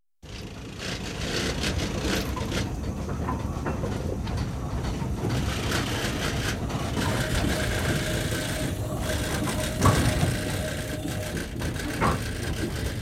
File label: Steam Crane, passes right to left & coupled to coal trucks; Machinery; CoalMining; BBC